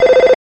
Receiver Phone

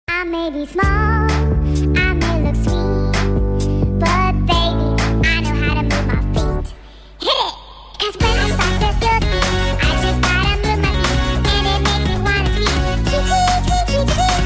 Sweet Baby Song

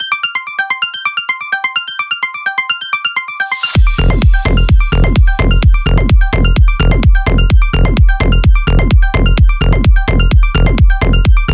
Twinkling Intros

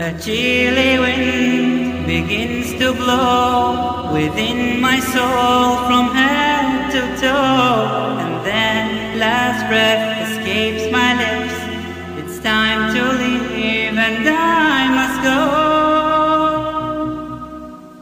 Last Breath

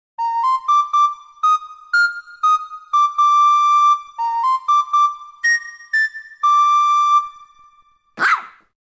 plays flute; Momiji

